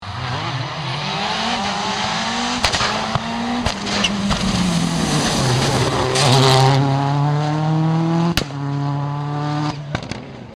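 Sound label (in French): skoda, rallyes; Rigollet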